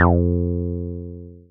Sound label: Synths BASS